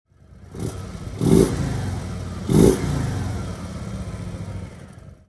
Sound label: touring dr jekill mode